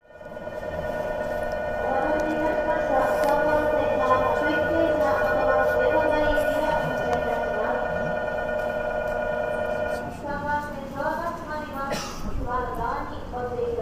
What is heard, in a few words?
train